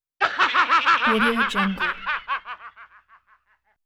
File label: evil laugh